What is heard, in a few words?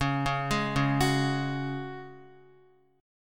Db Suspended 4th, Guitar; arpeggiated; chordshape; JGuitar